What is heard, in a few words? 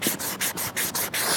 Writting